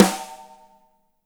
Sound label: DRY SN; Spectrasonics; Burning Grooves; BG SNARES 4